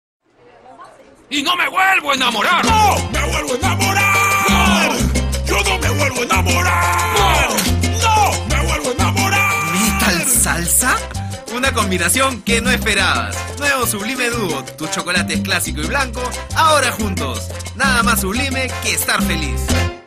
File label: Metal Salsa